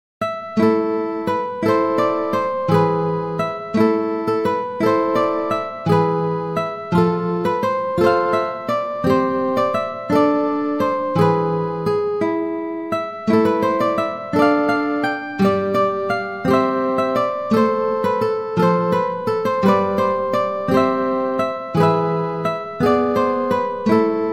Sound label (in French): guitare solo